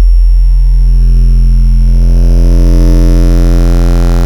Retro Sweep Pulse; Ableton Live 12 Lite; Vector; Sprites

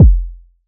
Kick; Ableton Live 12 Lite Core Library

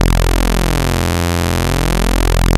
Saw Dual; Basics; Vector Sprite; Ableton Live 12 Lite; Sprites

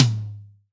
Tom, Drums; One Shots

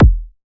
Kick; Ableton Live 12 Lite; Core Library; Drums

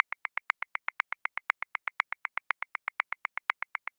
Perc Cowbell Accent 16ths; Grooves; Percussion; Ableton Live 12 Lite; Core Library

